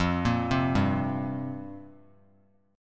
F Suspended 2nd Suspended 4th F down Bass Standard; arpeggiated; JGuitar